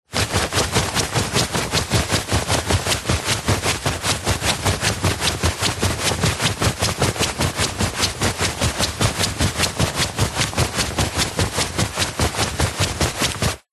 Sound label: foley clothing movement shorts baggy running sprinting